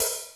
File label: HAT OP; MPC-60 DRUM2; Greytsounds VOL-1